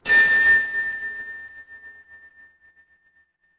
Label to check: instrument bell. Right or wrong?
Right